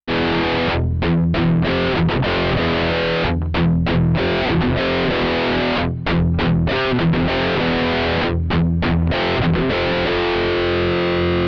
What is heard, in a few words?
heavy dry; palmer pdi 03 jb